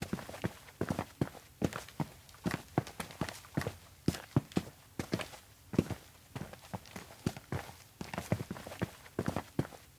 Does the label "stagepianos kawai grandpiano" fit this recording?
No